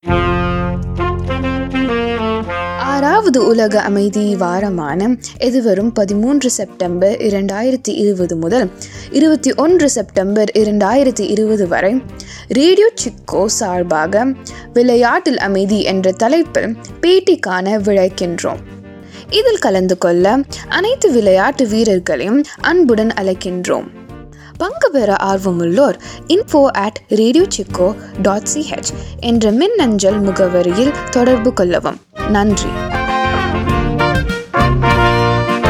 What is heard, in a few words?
jingle tamil